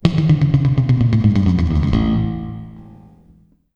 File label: Bass, 130 BPM; Sampleheads; Ultra Freakin' Bass